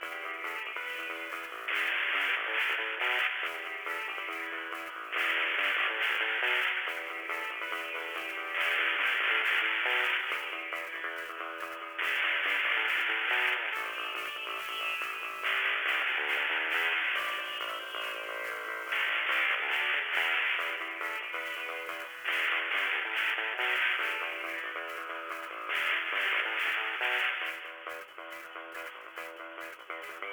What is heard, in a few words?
music elevator; TyTheTasmanianTiger3; XBOX